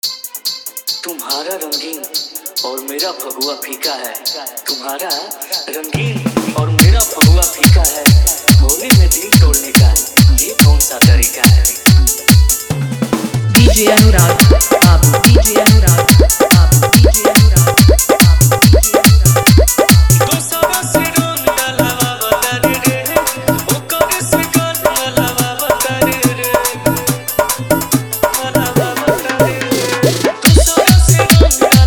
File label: Sad Holi Dj Song Mix